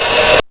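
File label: Tom Kit open hat; classic machines; SCI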